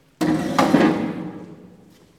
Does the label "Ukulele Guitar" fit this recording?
No